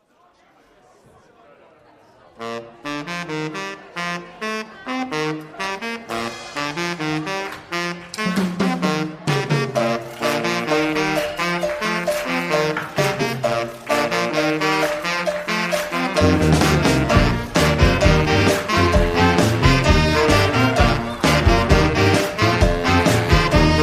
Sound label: sample samba